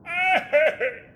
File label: moan; zarbon